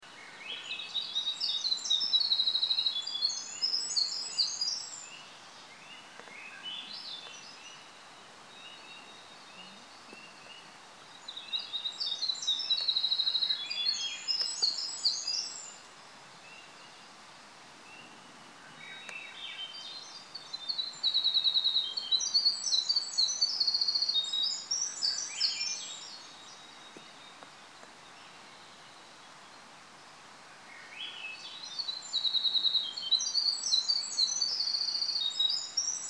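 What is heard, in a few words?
Morning at Merriam Swamp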